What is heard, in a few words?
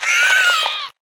Swamp Dragon Egg Attack